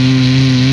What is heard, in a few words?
Engine Loop, retro arcade vehicles